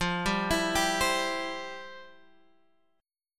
F Major 7th Suspended 2nd, F, down, Guitar, Open G